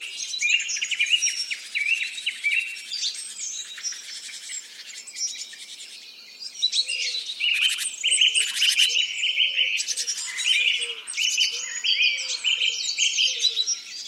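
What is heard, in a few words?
sounds of birds singing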